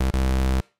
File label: beep fast